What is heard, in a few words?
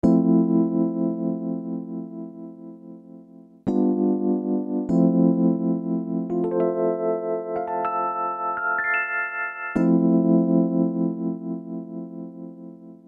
Keyboard